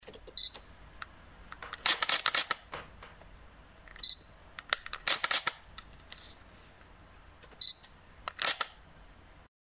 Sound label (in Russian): Звук затвора камеры sony